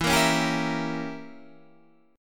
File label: E Diminished 7th Guitar Standard; Edim7 strummed; JGuitar